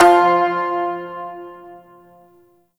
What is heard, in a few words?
Shawzin Oct One F; Warframe